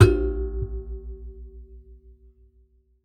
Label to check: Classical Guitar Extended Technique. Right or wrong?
Right